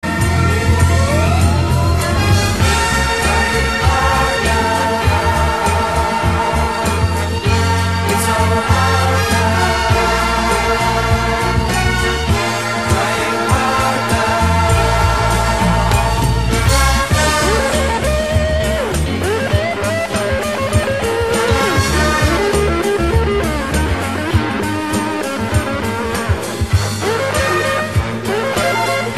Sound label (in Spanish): Música de entrenamiento